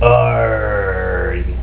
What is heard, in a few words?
aargh; the pirate theme